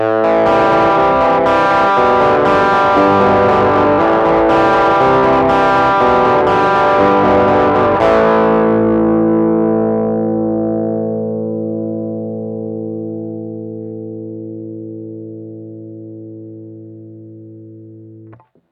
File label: bb preamp gain; xotic